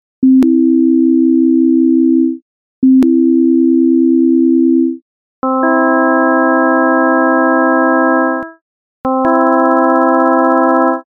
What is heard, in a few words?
thirds